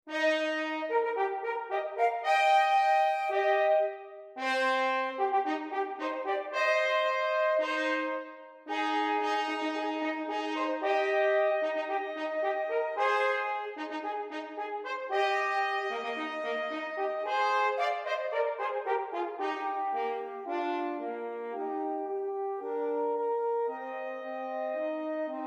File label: Concord Fanfare, フレックス二重奏